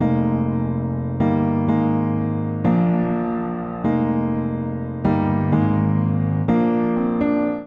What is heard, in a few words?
Progression ii7-I-IV-V Eb Major 125 bpm, Upright Piano; MIDI Clips; Train Tracks; Ableton; Factory Packs; Spitfire Audio